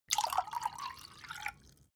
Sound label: glass pour ring; berufe; gastronomie; soundpool